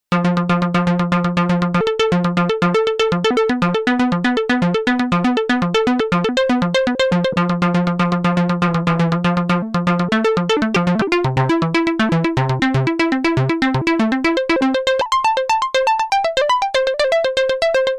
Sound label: Modular